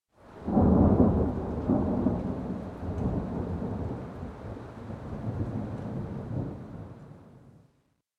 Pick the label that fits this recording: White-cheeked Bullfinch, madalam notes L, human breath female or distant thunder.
distant thunder